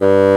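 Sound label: bassoon G2; East West - Global Sounds; WOODS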